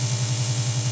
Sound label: holding cell; Star Wars Knights Of The Old Republic II; XBOX